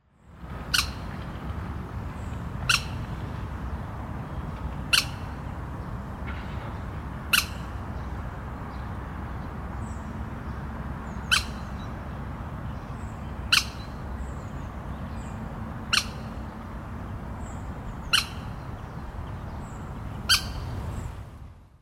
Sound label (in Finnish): liejukana kutsu; linnut